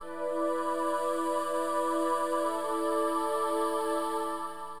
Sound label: PAD F5; Acid Jazz Funk; Acidpads